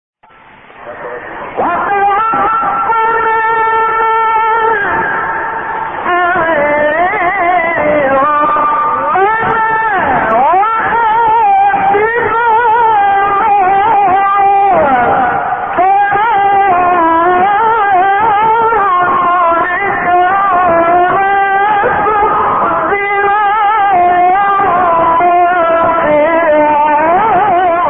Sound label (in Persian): تلاوت فوق العاده از آیه سوره مبارکه آل عمران; استاد مصطفی اسماعیل